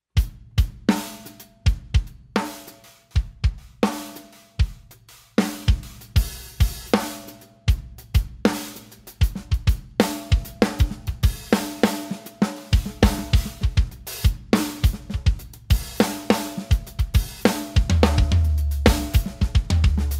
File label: MEINL DEEP